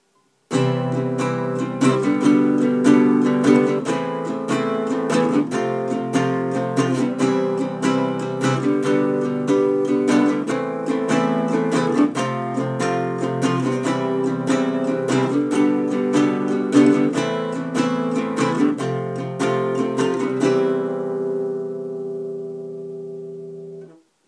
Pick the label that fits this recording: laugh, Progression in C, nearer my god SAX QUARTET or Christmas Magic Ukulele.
Progression in C